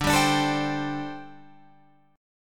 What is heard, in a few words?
D 7th Suspended 4th, down, Guitar, Open F; D7sus4; strummed